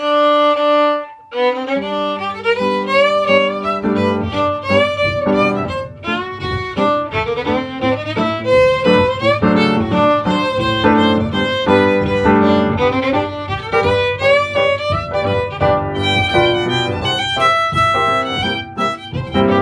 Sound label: polka